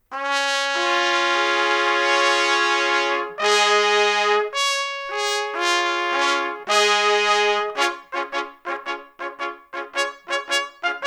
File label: fanfare play along